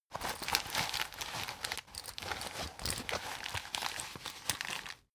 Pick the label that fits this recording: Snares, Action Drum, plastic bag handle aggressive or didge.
plastic bag handle aggressive